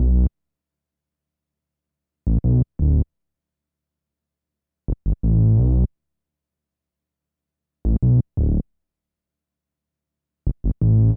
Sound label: Hip Hop Synthbass Ebm; Digidesign; Pro Tools; Pro Tools Essential Demos; Essential Loop Demos; Demo Session - Hip Hop